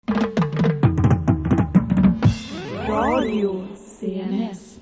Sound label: jingle